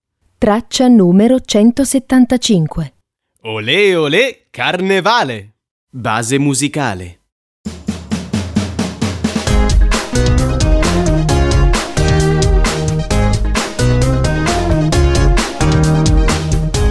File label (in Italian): base musicale; scuola al centro; infanzia